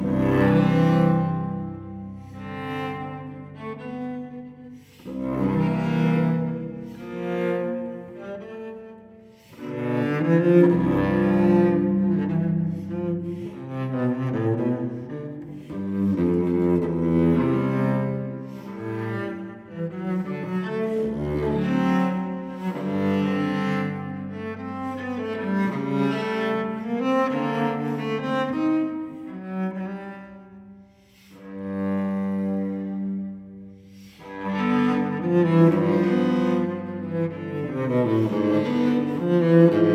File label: Cello Suite No. 3 in C major Sarabande; BWV1009; Johann Sebastian Bach